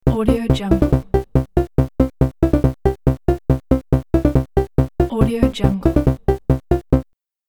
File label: retro subtle soft musical element